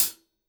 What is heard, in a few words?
Kit HH, Drums Acoustic; USB Soundscan vol.10; AKAI; 90s sample CD